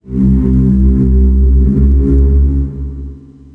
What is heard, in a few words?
BASS